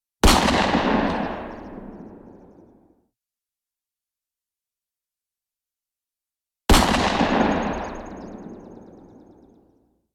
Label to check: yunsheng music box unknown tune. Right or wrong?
Wrong